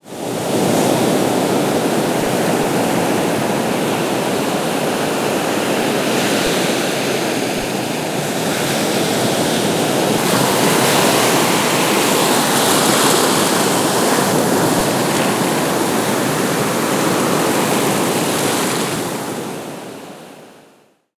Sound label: SEA; USB Soundscan vol.45 - Indian Traditions; AKAI; AMBIENCE2